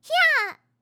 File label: swing, fighting